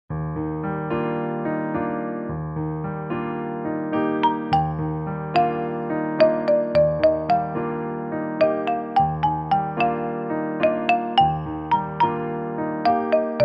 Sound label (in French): Clavier piano